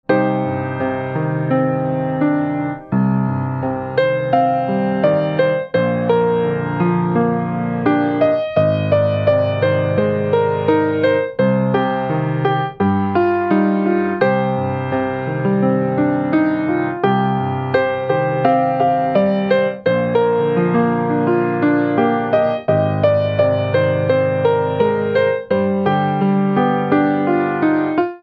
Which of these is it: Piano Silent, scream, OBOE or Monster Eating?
Piano Silent